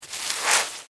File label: Foley Sand Pail Dump Small Shovel; Playgrounds (Sound Effects:Backgrounds)